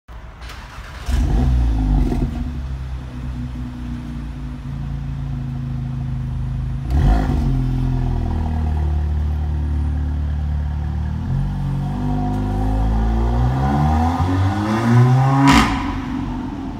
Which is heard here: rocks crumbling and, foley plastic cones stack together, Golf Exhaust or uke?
Golf Exhaust